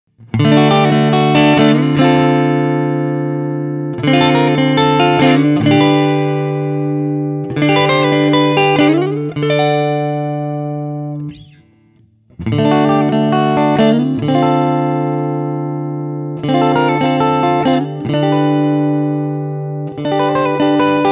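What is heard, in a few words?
Guild X92 Citron, Clean, D-Shape; Guild X92 Citron Breakaway from the depths of 1984; ODS100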